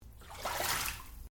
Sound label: Water Bath Spa Movement